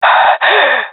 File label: death, swat; vo; Counter Strike Model Pack; CS:GO; swat epic